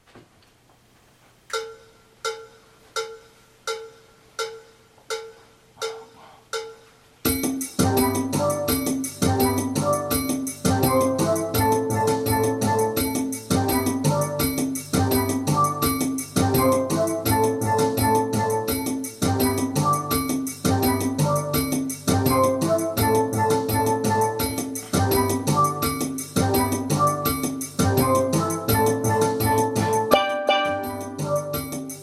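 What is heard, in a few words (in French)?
Ténor jam + PONT, Steel Band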